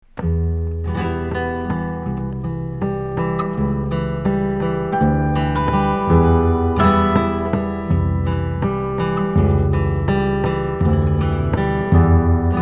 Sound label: Slow Maracatu